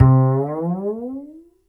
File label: Upright Acoustic Bass; Larry Seyer